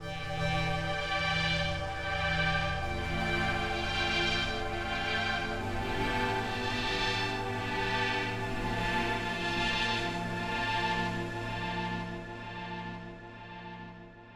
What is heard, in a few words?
Bell; absynth6; 传奇归来 Absynth 6 评测：十六年的等待，让经典融入现代